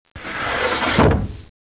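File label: GUILLOTINE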